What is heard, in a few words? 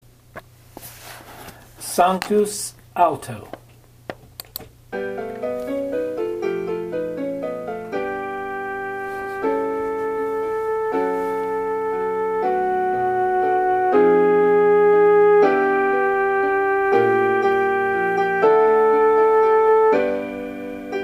Sanctus, alto, jazz mass